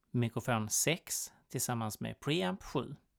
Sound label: mic pre